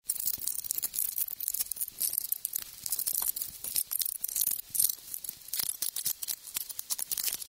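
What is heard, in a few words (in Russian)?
muravi kush muhy, ants eat a fly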